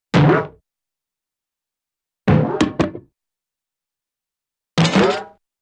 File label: Bounce - 'boing', Cartoon; Hollywood Edge; Cartoon Trax